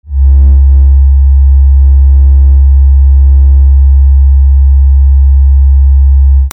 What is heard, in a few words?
AMBIENCE